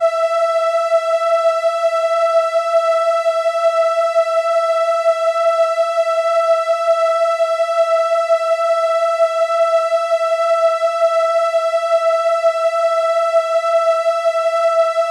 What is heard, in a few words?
violin E5, ARP Solina